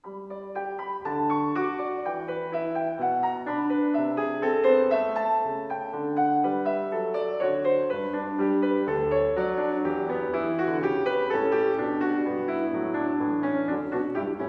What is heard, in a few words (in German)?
Violinsonate G-Dur - Variation I